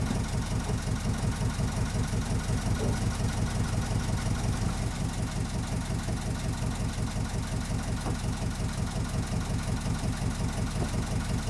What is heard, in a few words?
vehicle yacht idle; teardown; ps5 windows xbox series xs